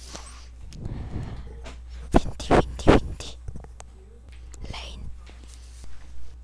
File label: windy